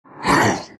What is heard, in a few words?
shards pain; terrorblade